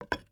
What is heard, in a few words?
ui drop metal wood; Rust